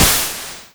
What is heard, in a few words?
hiss